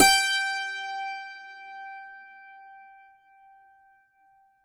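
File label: G5, dulcimer beat; SoundBytes; Early Patches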